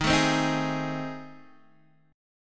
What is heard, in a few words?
A Minor Major 7th Flat 5th